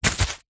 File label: money pouch fabric coins down on surface; foley